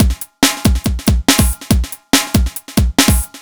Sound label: Synth Loop BPM140